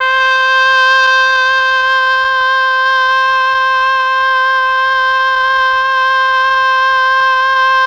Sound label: MEL OBOE